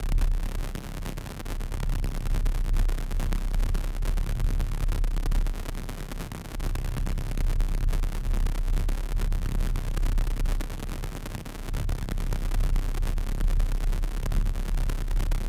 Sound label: Glitch Amb, Electronic; SFX